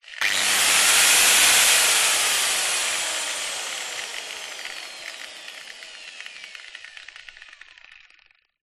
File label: industrial angle grinder short burst not cutting